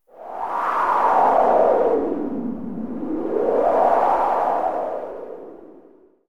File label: wind